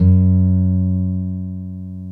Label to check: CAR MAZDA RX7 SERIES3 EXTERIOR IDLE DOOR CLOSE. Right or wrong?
Wrong